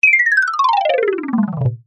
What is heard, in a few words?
cartoon descend fast mallets; zapsplat